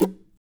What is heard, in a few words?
NOISES, guitar; Spectrasonics; Hans Zimmer Guitars VOL-2